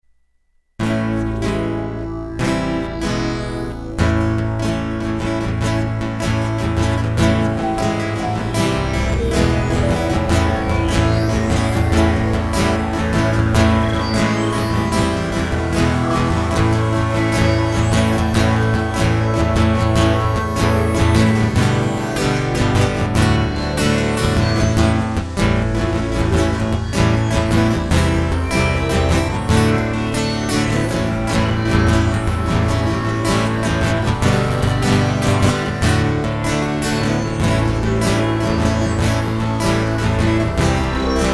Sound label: Opaque Romance Interlude Synthesizers; This Is Next